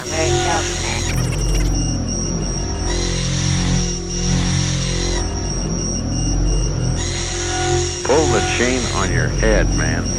flush; brainpipe; flush your mind

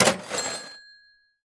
Cash register

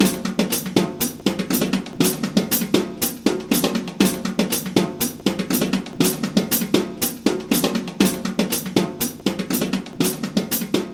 Drums; The Touryst; PS4 PS5 Switch Windows Xbox One Xbox Series XS gamerip 2019

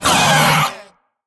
Attack

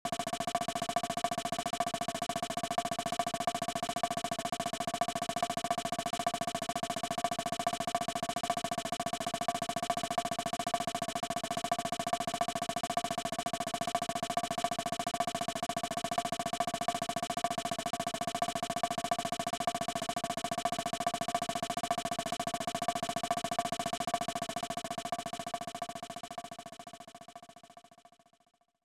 Drum Roll; view cinema paradise vol. 4 satellaview snes 1995

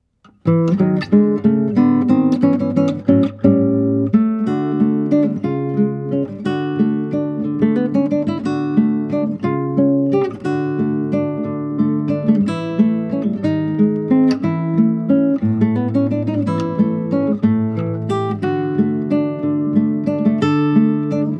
Underwater Background Music, guitar; Super Mario Bros.; super mario series for guitar 2010

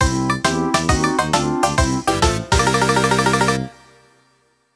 Lose Life

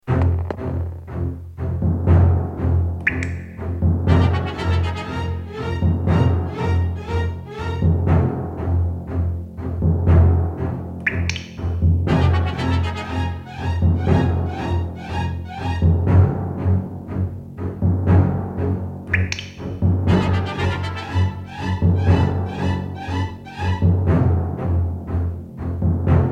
Cave; legend of zelda a link between worlds

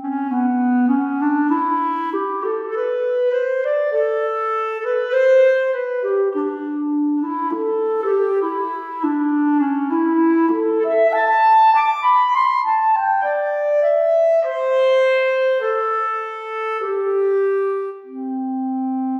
long bird pickup clarinet; Toki Tori 2+; Toki Tori Collection

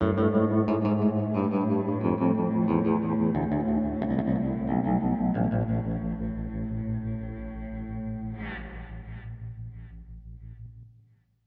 Music Stings; Entrance mood 1

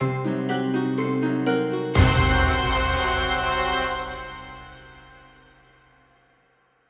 lose stinger; Royal dance

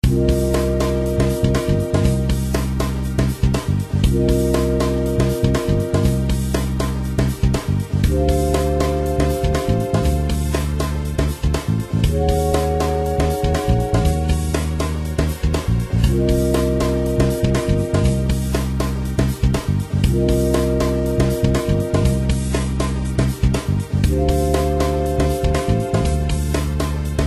drum bass; otome the exorcist

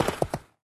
Knight Rider Charge Loop end; Linirea

